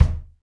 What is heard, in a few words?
Rock Kick; Roland BK-5